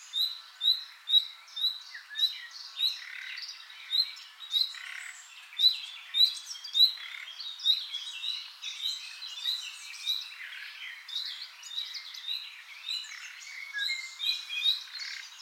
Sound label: Common Nightingale, Nachtigall